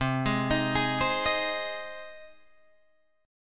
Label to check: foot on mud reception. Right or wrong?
Wrong